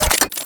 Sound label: Shotgun Mech; Warframe; Tenno; Lotus Pod Shotgun